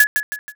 mahjong discard

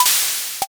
syringe

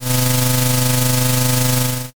shredder

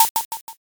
card flip

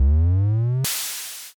compressor

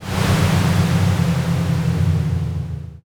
sigh crowd

